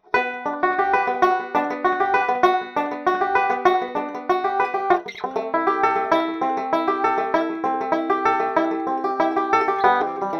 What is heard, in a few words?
banjo playing